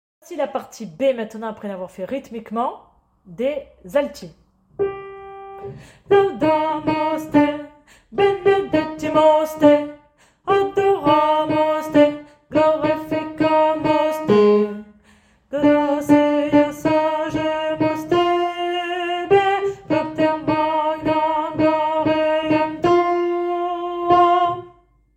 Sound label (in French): Gloria chantee alto